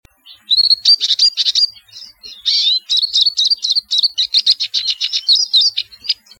tarin

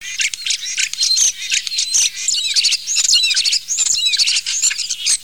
etourneau

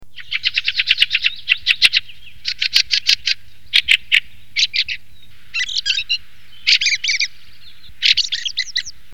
litorne, Musique

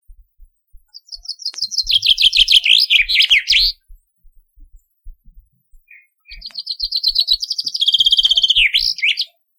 pinson, Musique

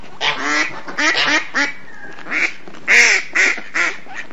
canard

